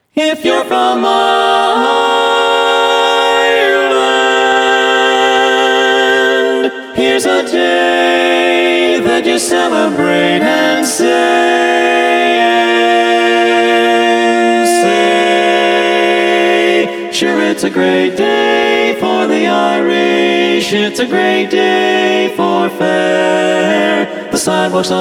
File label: Irish Medley